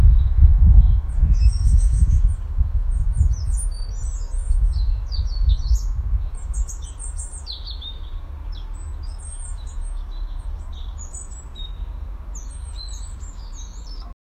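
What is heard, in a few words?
birds tweeting in the distance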